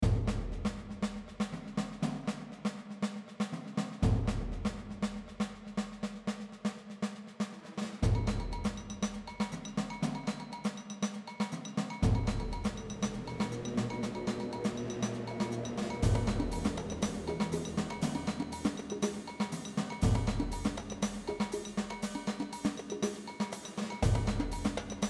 drum song; weeklybeats-2014

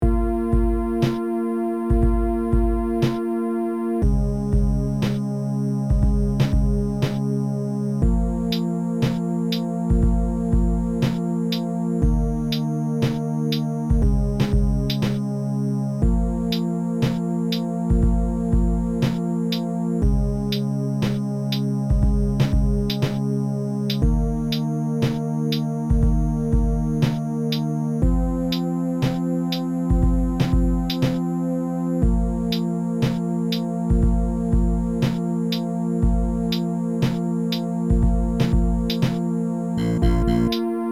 lofi funk hop; weekly beats 2020

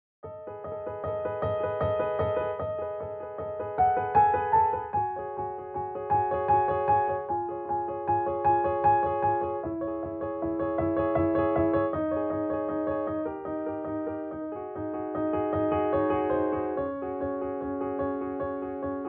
solo piano; weeklybeats 2024

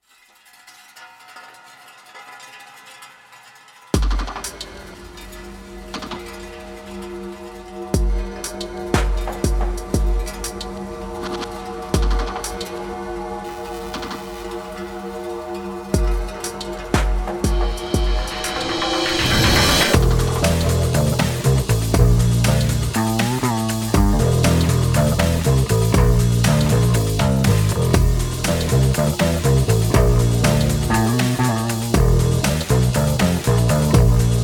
noise; weeklybeats 2024

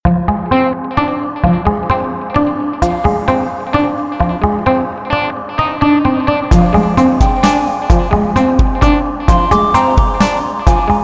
halloween spooky; dootdoot; weekly beats 2020

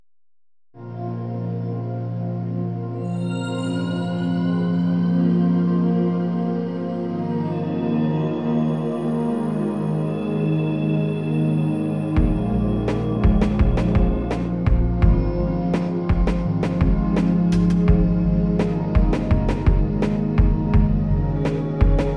ps1 style ambient; weeklybeats 2024

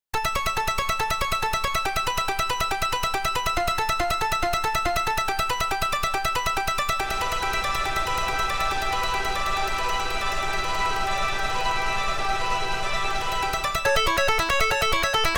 space y arp disco y thing; weeklybeats 2026

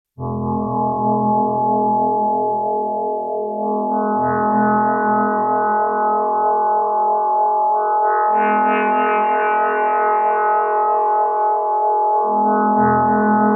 a pleasant drone

